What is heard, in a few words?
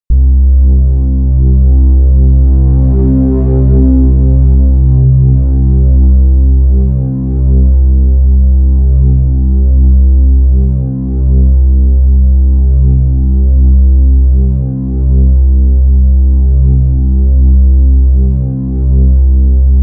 drone bass